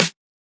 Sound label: Hiphop snare; Dry original